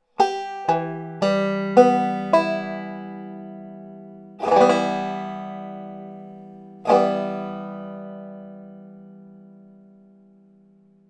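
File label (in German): der e moll akkord, banjo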